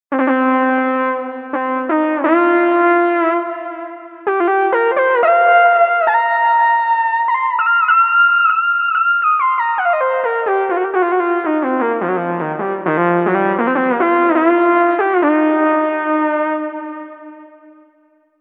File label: Pat Syn Gt; TURBO VL